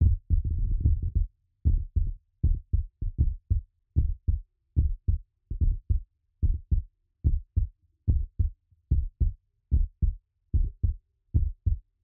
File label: Heartbeat